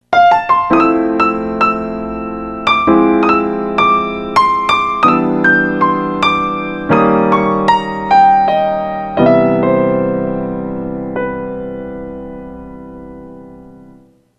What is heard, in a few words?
Ring